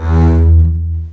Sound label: String Collection, MARCATO; East West - The Ultimate String Collection